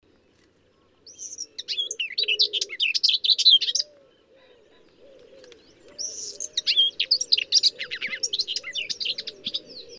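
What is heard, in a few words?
whitethroat communis imitation, Stiffkey; uk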